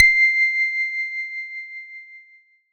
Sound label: C7, Roland MKS-80 Leads and Keys